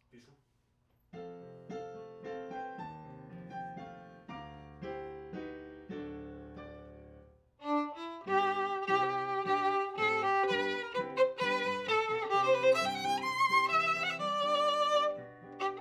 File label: Viola C